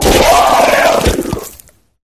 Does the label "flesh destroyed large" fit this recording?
Yes